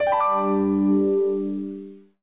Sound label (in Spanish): aviso